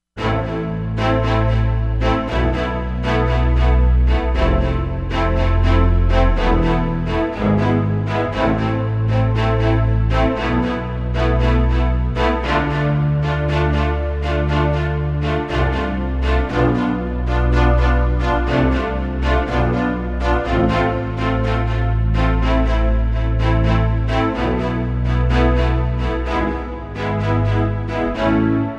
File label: Arco Synth, Korg MSC-07S Synth